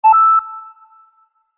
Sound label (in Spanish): notificacion want to cry